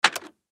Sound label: Button Switch